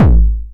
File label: 909 BD Tape Sat